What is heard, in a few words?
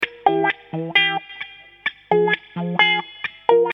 Funk Guitars